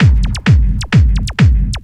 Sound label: techno, DRUMLOOPS